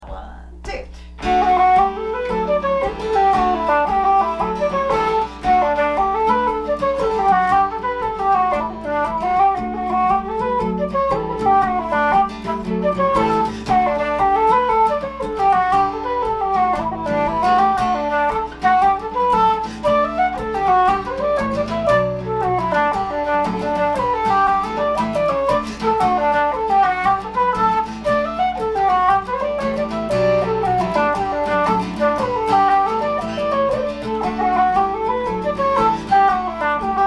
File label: Flute and guitar